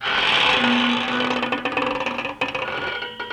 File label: Door Screen Creak Slow